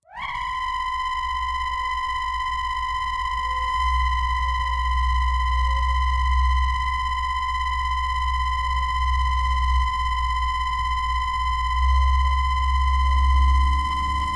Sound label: Machine, High Generator, Alarm, Polishing, Moving Drone; power core hum